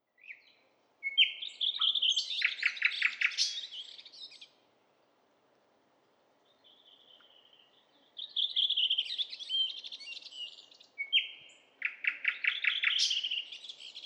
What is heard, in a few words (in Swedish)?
naktergal Luscinia luscinia sang